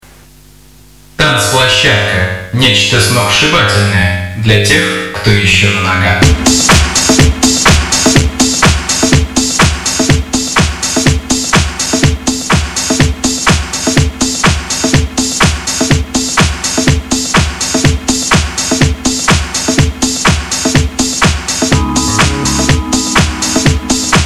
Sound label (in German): tanz music